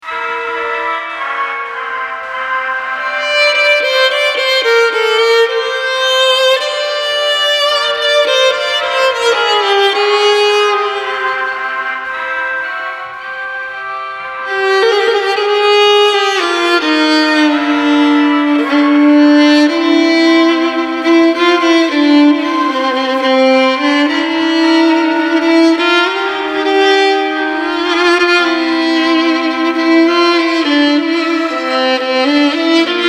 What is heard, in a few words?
martial klezmer